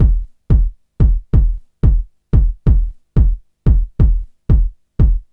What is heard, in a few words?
Kick Loop, Drum & Bass